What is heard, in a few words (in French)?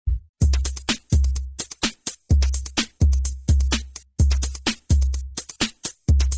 jingle